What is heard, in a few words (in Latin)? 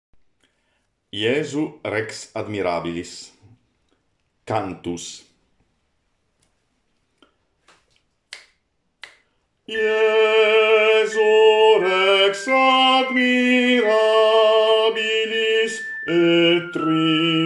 Cantus; JESU REX ADMIRABILIS